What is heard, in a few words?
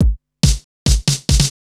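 DRUMLOOP ELECTRO 140; Techno2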